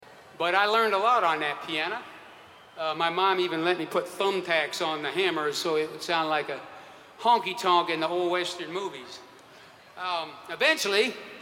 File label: Piano Moment